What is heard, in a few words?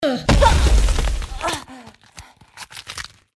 Warcry; Goddess of Trampling; Athena